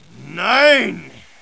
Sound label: exclamation; Medal of honour; MOHAA; Desktop Themes